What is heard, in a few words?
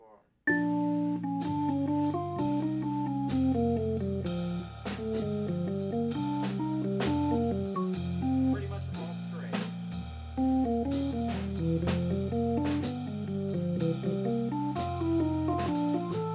jazz Halftime